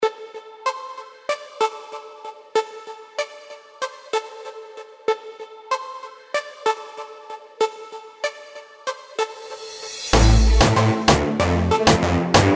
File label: reggaeton